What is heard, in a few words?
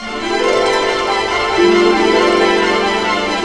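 128, orchestra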